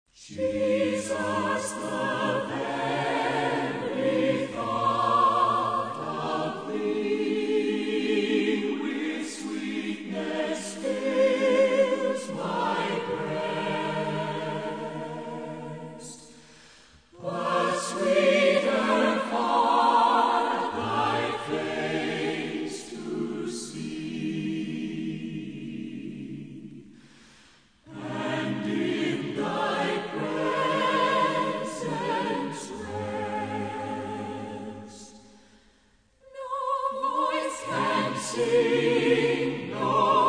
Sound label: Philharmonic Orchestra Choir, Jesus, The Very Thought of Thee